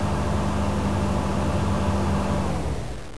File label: blower end, train; APT; OpenBVE